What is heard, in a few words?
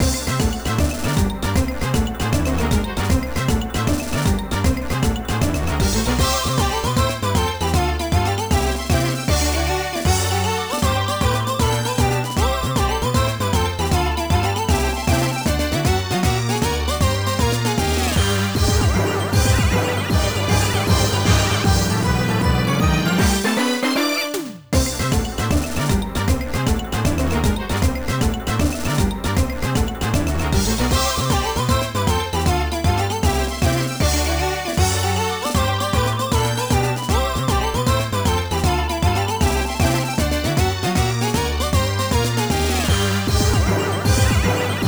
Balloon March 2 (Stage 4 Boss BGM); TwinBee Yahho! Fushigi no Kuni de Ooabare!!; Konami GX